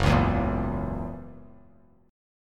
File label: Gb 7th Gb down, chord; strummed; JGuitar